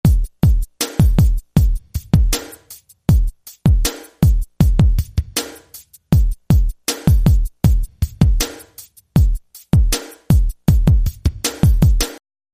Drum Loop

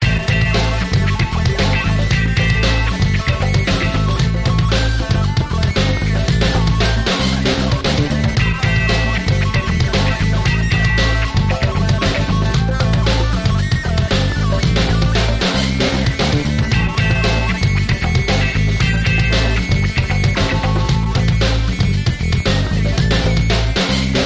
Dont Drop The Beat

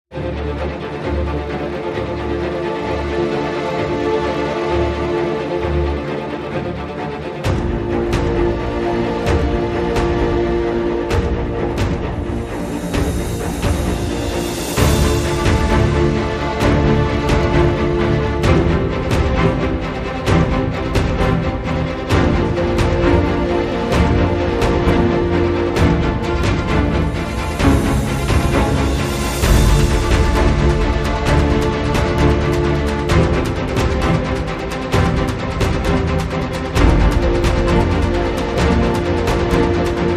No Brass No Lead Strings

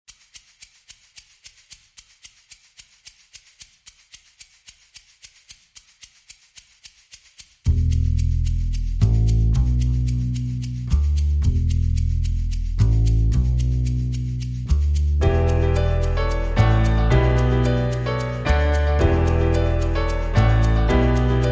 Piano

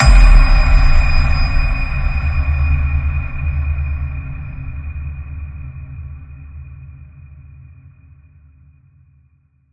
High Ping, D sharp

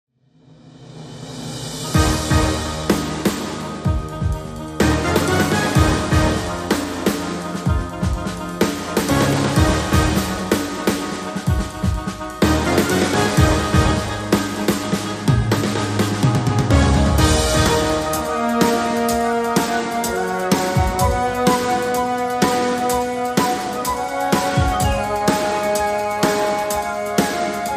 Brassy

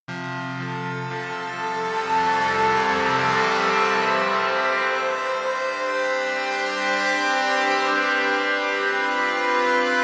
AMB Guitar

